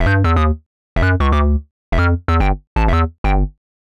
Hard Synth Bass E, 125; PowerFX - Nu Jazz House

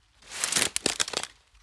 Trudge Step, outdoor, Footsteps

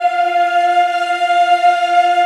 F4, Choir; USB Soundscan vol.28; Acoustic & Synth; AKAI

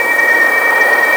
A#4 WIND; Northstar; The Wizard VOL-1; TERROSTROIKA

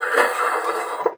PUSTE, BASSOON; Xsample VOL-6

